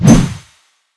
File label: mace slash; weapons